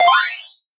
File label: Collectibles Classic FX